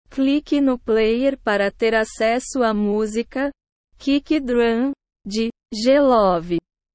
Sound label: kick drum